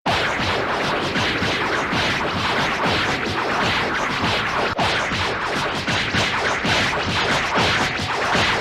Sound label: barrage effect; dragonball